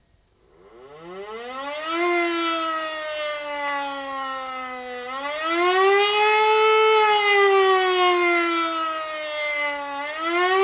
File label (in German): Sirene Warnung